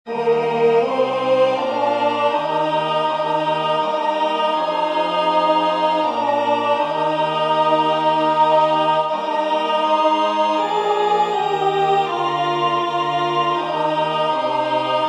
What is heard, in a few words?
Offertory alto; singing divine service iii in parts